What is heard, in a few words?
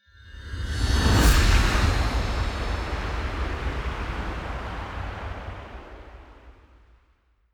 whoosh magic gust